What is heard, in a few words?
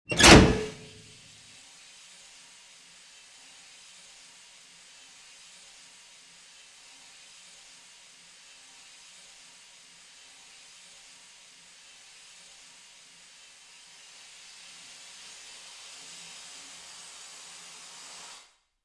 Ignition of a burner on a gas stove; fire